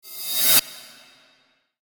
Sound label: magical; maoudamashii